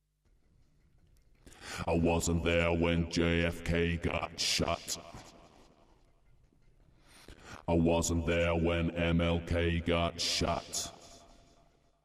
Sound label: Vocals; PixelJunk Shooter; PS3